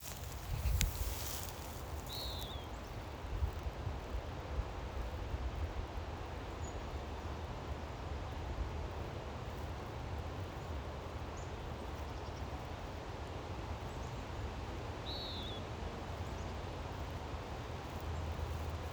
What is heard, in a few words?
western wood pewee